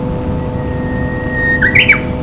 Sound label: uguisu; hayatine